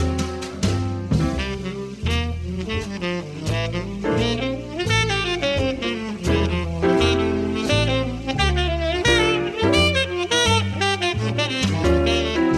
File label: lick